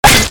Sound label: hit